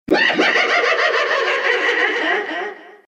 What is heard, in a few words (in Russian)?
adskiy smeh